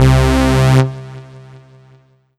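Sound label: Roland PN-D10 Live Jupiter Brass C2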